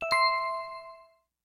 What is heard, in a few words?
Quest Box Open